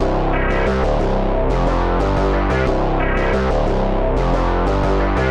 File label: Bass loops; Zystrix